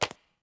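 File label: bad run stone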